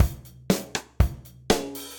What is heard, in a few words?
Kit Room With A Vista, Session Drums Standard Kits; Ableton; Session Drums Studio